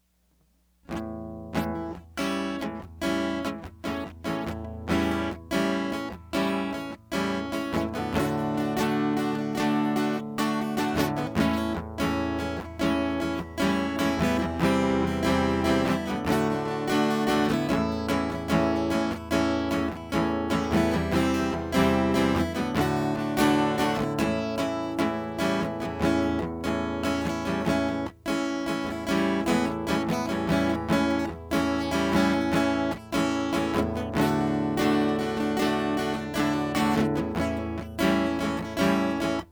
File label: instrumental